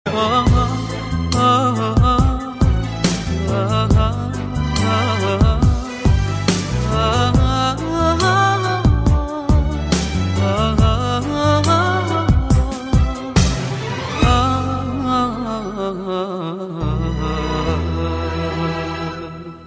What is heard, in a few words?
Ahhh; Saber